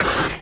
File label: biomech run engine